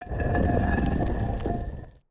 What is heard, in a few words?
pillar shake; battle